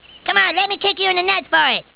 kick

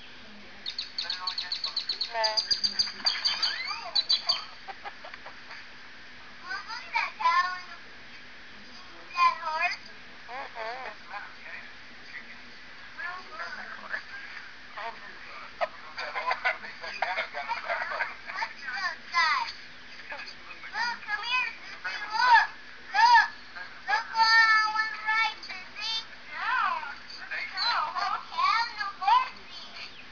cow

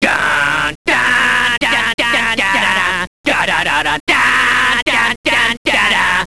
singing

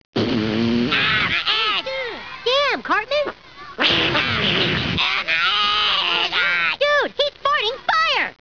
fart fire

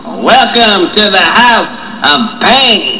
pain

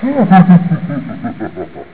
laugh, Mean laughter; Haunted

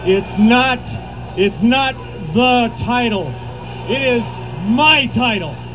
sting

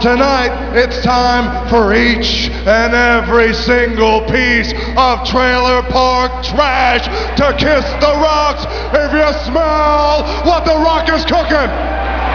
rock

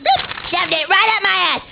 bleep; South Park; Episode 108 "Damien"; I wrote it out and I shoved it up my ass.

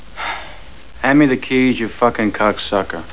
keys

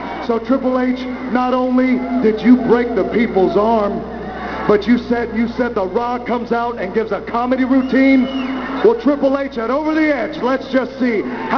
rock

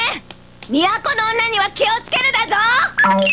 chi chi yell

